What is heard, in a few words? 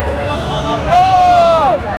crowd hushes as emperor